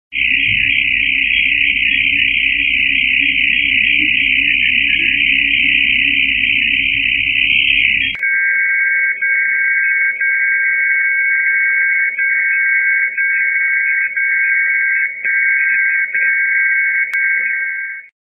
DCF77 akustisch

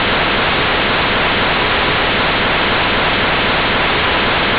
v rausch

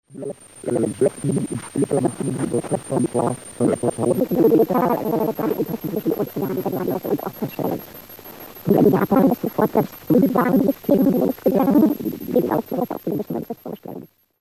Oktavverschieber

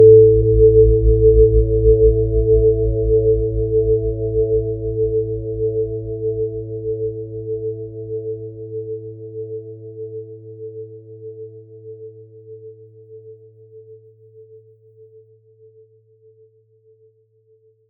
Gong

